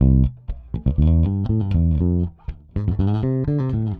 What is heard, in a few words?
Pop, Bass